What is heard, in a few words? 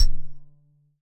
Tech Drum Hit, techno 120bpm